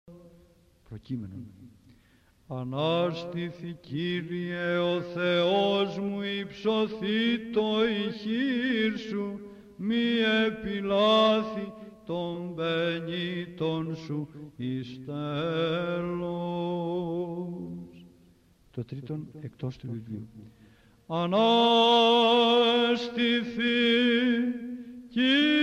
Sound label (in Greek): ΠΡΟΚΕΙΜΕΝΟΝ, Psaltichie; ΑΝΑΣΤΑΣΙΜΑΤΑΡΙΟ; ΠΛ Α-Β-Δ ΒΑΡΥΣ